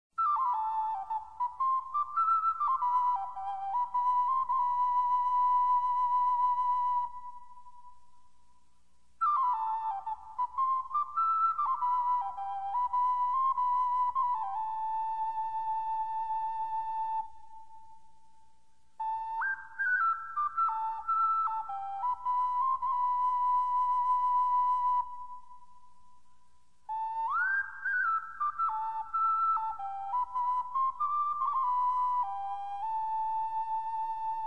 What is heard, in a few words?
sea shells pebble stones